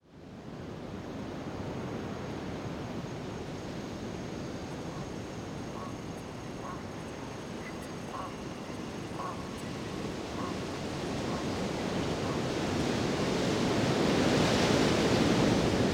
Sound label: pirates ambience; Worms 3D